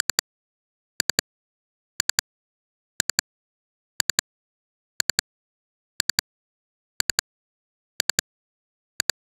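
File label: satisfying menu navigation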